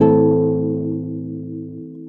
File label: beautful strum, Guitar; Notes